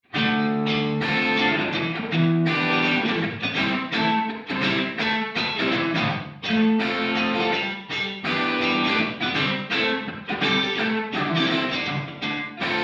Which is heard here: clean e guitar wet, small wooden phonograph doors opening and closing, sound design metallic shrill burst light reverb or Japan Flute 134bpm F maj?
clean e guitar wet